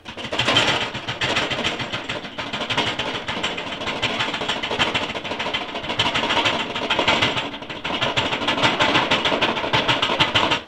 Metal Rattling; Gerhard Straub

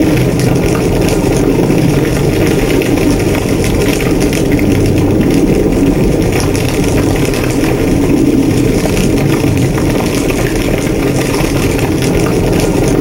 House on Fire

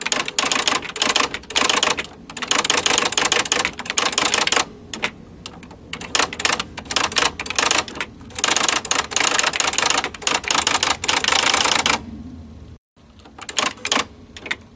electric typewriter; daniel simon

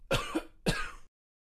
Coughing

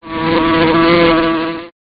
Wasp

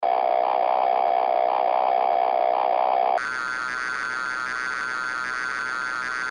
Missile target tracking lock; Ntfs

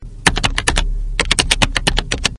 Keyboard Typing Slow

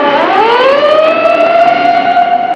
Fire Truck Pass By

